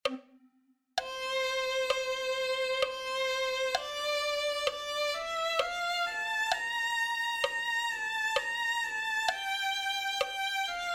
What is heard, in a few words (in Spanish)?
escondedero violin